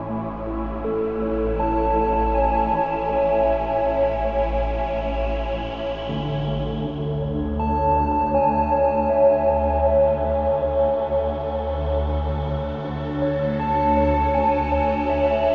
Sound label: Early Morning Adventure Loop; Riverbond; PS4 Switch Xbox One Xbox Series XS